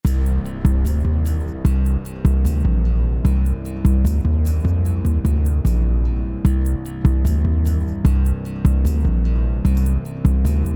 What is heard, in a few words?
Flight 150BPM Dark; Dragons Destiny OST